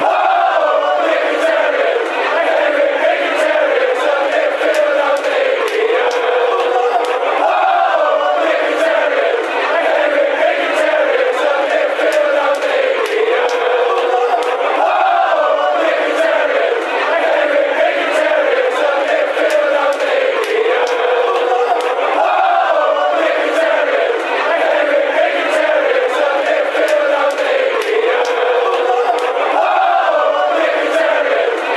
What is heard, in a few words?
henrikh mkhitaryan midfield armenian, fanchants